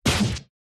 Cut

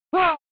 Fighting Shout; SNES

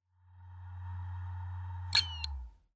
Hard Disk Drive Forced Shutdown

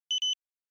Cash Register Beep

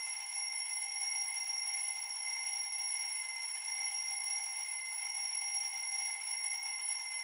Clock Alarm Far Low Loop

